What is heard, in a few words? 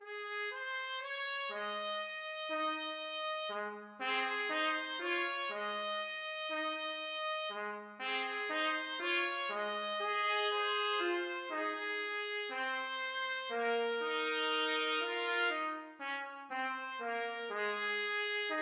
when the saints, trumpet duet